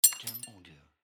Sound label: Breaking Glass Hit